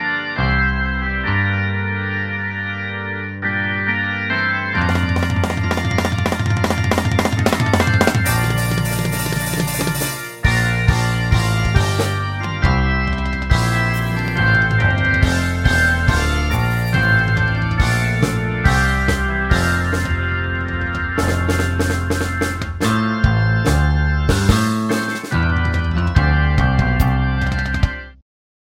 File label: prog hard rock